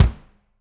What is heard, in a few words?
room Kick, drum